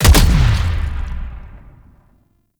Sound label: Infested Shotgun Alt Fire Punch; Weapons